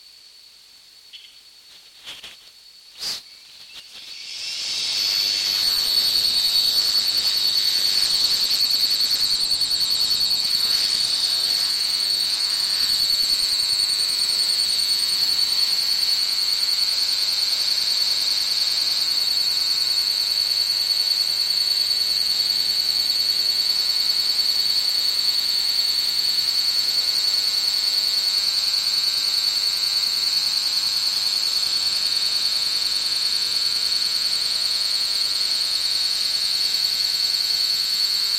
recording cfl bulb modulated by radio signal embedded with subliminal hello